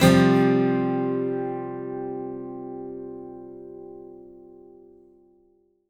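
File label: acoustic guitar C sus up, Whole Lotta Country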